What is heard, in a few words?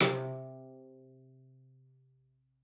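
CELLO spiccato C3; 90 s Sample CDs; Xsample VOL-5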